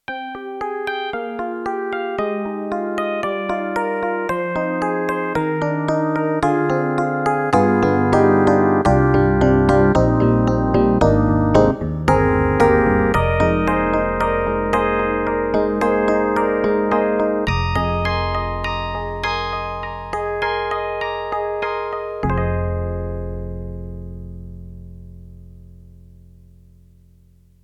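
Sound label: YAMAHA MU1000EX, Rattling DX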